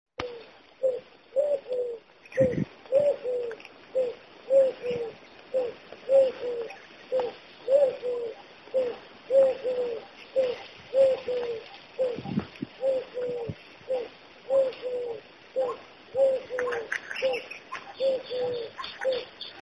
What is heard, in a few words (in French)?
tourterelle turque